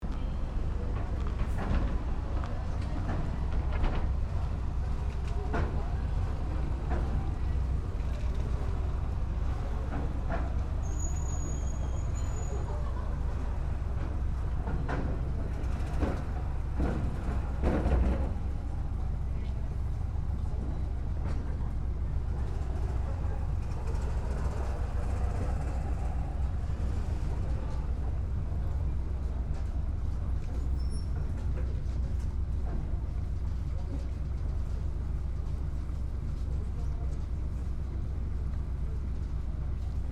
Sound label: Ferry; Noko